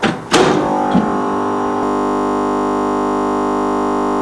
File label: 7500kVA transformer; XDM; ambience